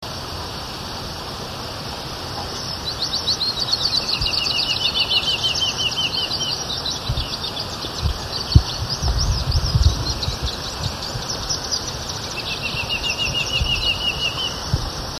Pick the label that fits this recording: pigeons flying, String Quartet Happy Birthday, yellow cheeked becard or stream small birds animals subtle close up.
yellow cheeked becard